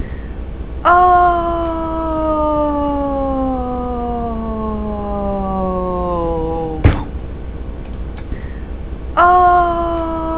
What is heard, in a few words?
boom